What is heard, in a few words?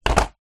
sport skateboard small plastic childs down on ground